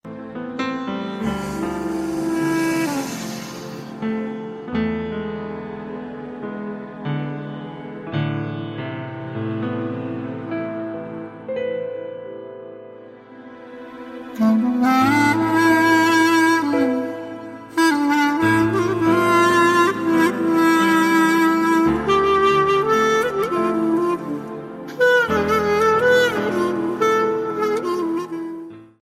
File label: sad music ringtone